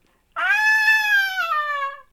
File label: old lady screaming